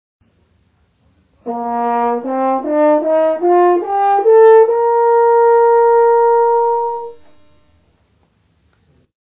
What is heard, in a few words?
Bb single tube tone